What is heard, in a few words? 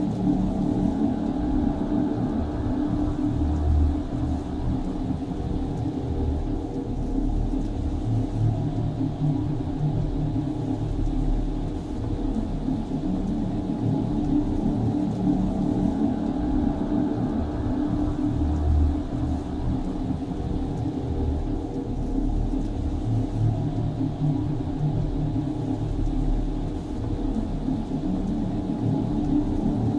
ship titan idle; Stellaris; machineage